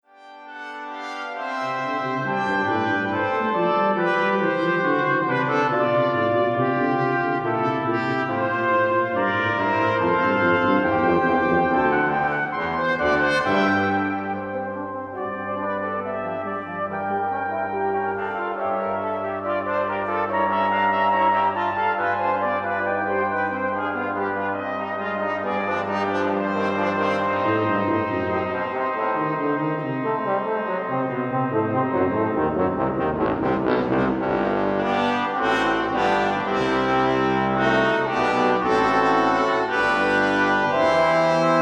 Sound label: Mendelssohn Organ Sonata